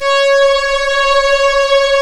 C#, strings; Big Fish Audio

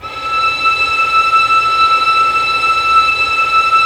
STR VLS, STRINGS; Roland - LA Composer vol. 1

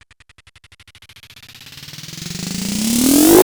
START SLOW, PERC SWEEPS; ILIO; Trance Fusion

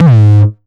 909 Grime Layer 2 - G#2, Drums, Kicks; Mbase 11

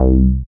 SYN TB ORIG, Roland TB-303; TB303+MC202; Sound & Vision - Gigapack I CD 2

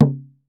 COCHITI, American Natives; E-MU Formula 4000 Series Vol. 4; Earth Tones

